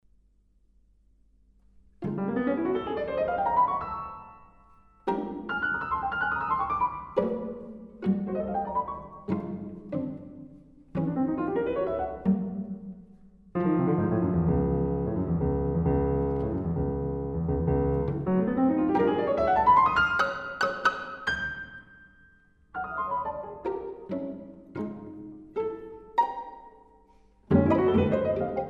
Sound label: Piano Quintet